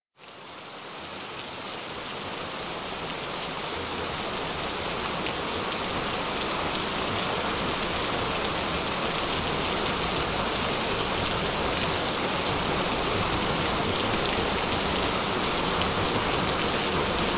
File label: Gente Rain Sounds